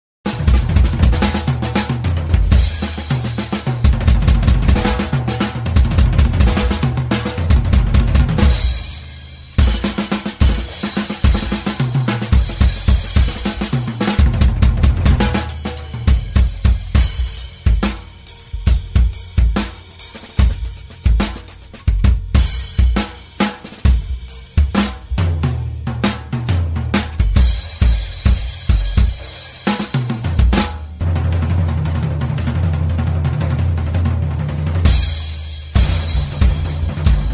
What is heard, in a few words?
DRUM SOLO; 1MIN